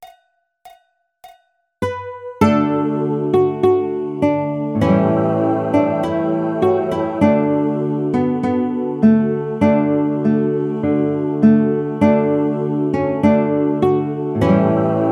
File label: Auld Lang Syne Guitar